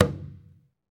Skin Drums

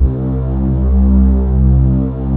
ANA STRINGS, pads; Pro-Rec - Synthsonic

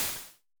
Shakers, Vermona Mono15 Drums

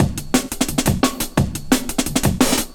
Break 175; Drum&Bass; EZ Rollers

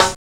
hi pic, snares; Zero-G - Funky Elements

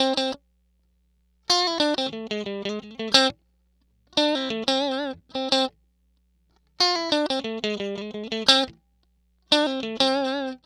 Electric Guitar

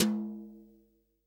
TOM, Real Drum Kit, SWING KIT

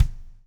BLUES TK, Backbeat; Spectrasonics - Backbeat

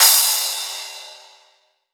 Crash, Drums Cymbal

